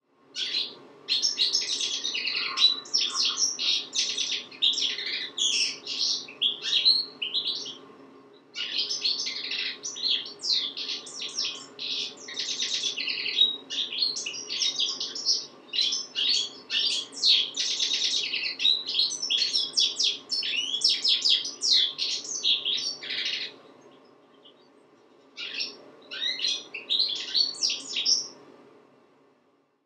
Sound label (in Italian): cardellino; 6 cardellino; I 7 CANTI